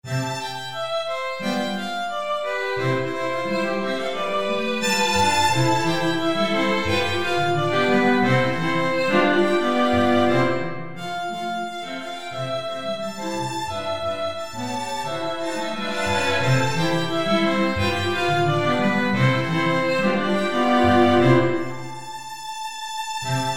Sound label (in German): streichquintett